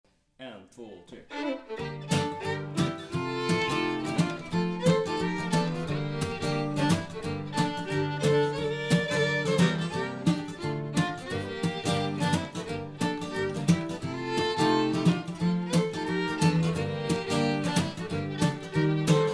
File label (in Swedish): Schottis från Norge stämma och melodi i tempo